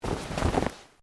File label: Clothing Shirt Cotton Move Quiet; Movemement sounds for various articles of clothing; Dressing